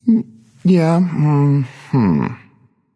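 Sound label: non verbal agree, voice; cernunnos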